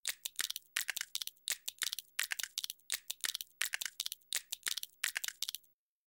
Bone Rhythm Loop Dry, cartoon gadget fx

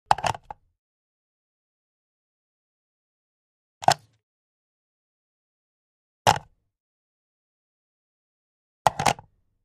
Telephone Receiver

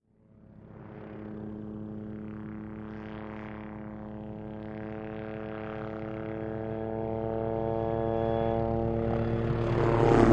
OH6 Loach Dive In By, little bird

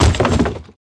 Book Tumble Wood

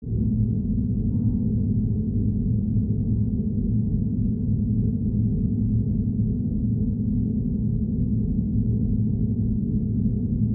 Ambiance Mid Bass, drones & tones

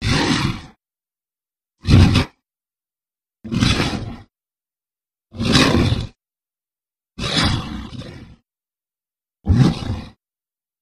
Monster Attacks, monsters

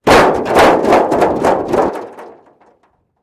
Car Hood Drop On Groun

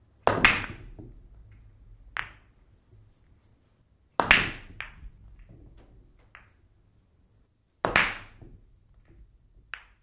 Billiard Ball Bankin

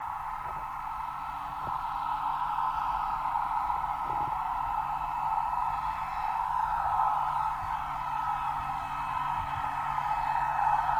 Torpedo Jet By, torpedos

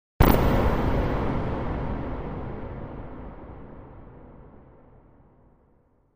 Electro Static Shot

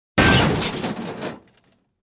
Crash Metal Shatter

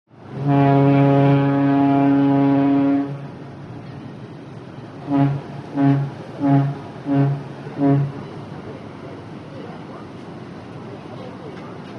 Ship Horn 1 Lng 5 Shrt, boat horns

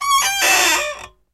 Chair Squeak Rockin

